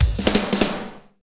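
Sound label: tek rock, Roland drum beats; Northstar - Drumscapes Roland; Fast Tekno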